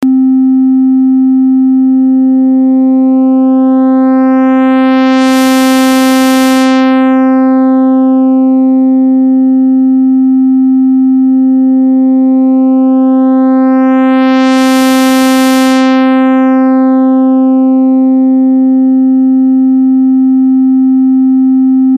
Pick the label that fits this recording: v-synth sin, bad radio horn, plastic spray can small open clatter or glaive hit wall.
v-synth sin